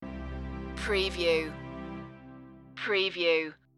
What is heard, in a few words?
sunlight stinger